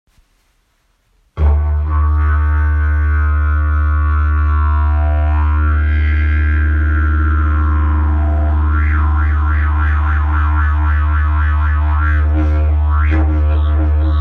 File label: didgeridoo; jilm svetlonos